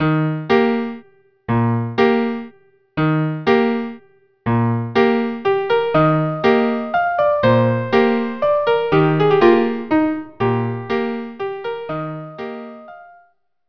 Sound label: waltz trio; Copp